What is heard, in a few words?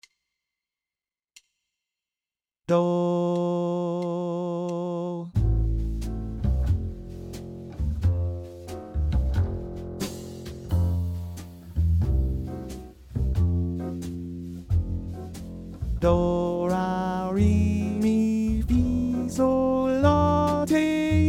F Symmetric 90 Bb